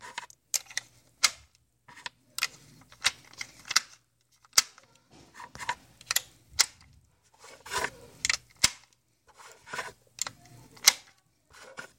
Mag Load and Rack

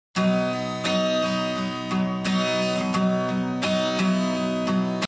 Guitar Play

